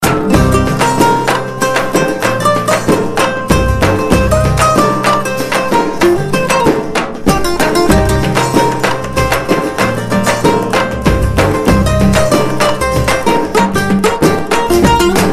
Slow Beats

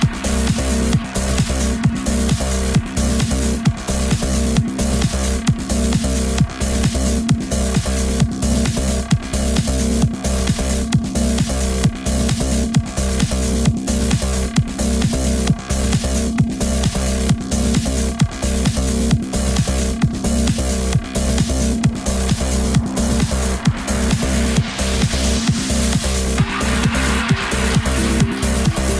Electro Beat

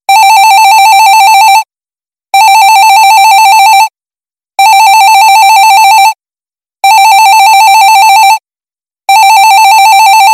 Office Phone

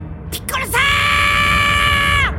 Piccolo

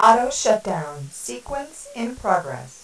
Time Warning

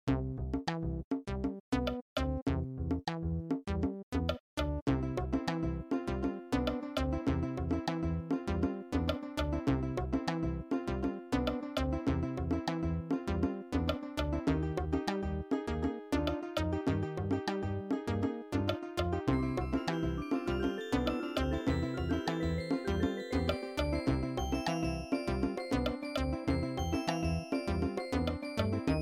Snake Music

